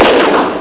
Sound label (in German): PISTOLE